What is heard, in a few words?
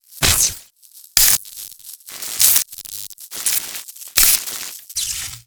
security cam sparks; Explosions; modern warfare 2